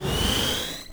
grapple raise; Quake 4 Alpha; weapons grapple

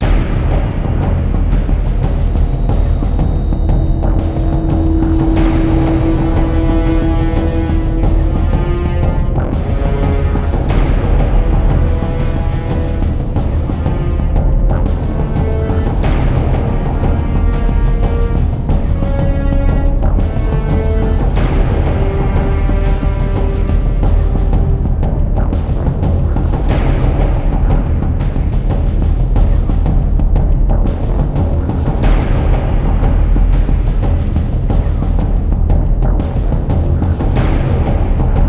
music; Quake 4 Alpha; trench intro

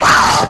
imp pain, monsters; Doom

